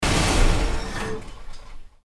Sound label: engine off outside car